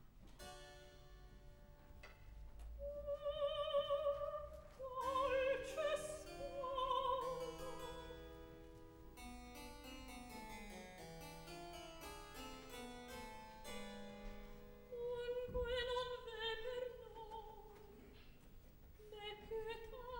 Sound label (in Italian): Atto terzo Recitativo; Scena 3; Giunia, Cecilio; Mozart; Operas; KV 135 Lucio Silla - 1772